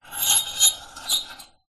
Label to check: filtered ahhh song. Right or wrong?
Wrong